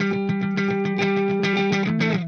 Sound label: Clean Funk Guitar